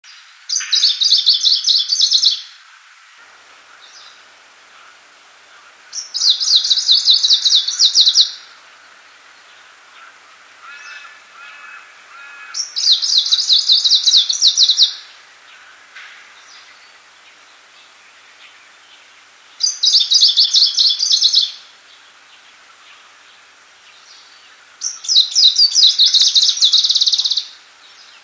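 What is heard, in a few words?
zelenaya penochka golos, greenish warbler voice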